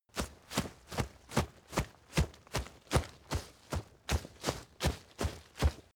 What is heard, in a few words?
step leaves wet run heavy sequence; schritte